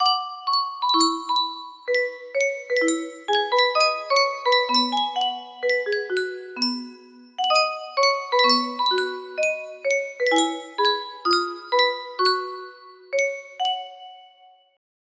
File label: Yunsheng music box, music box melody